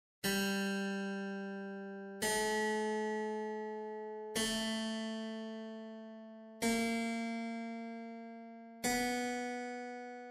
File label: tuning guide